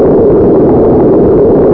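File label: wind loop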